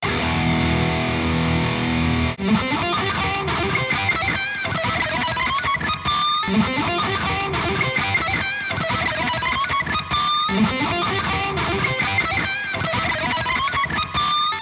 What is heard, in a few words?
sweating; metalman